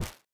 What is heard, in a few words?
step sand